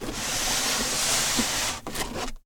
Big Cardboard Box Slide; Mechanical Wave; Cardboard and Paper

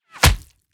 Bullet Impact Hit Body Flesh; PMSFX; Bullet Bys &Impacts

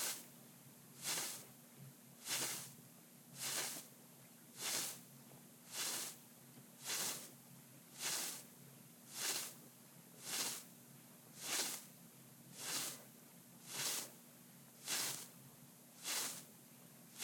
Beard Hand off Medium - Hand Body Foley; Joshua Reinhardt; Ultimate Hand Body

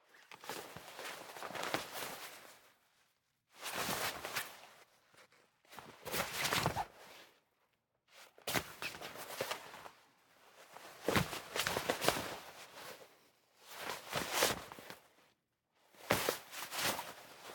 Neoprene Dry Wetsuit; TheWorkRoom Audio Post

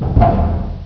duct, player; hl2

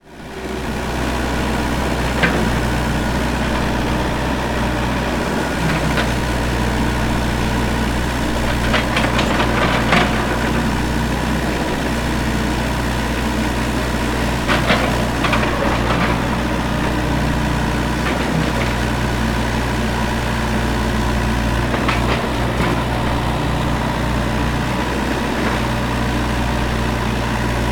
Excavator Working; Mechanical Wave; Excavator Sounds

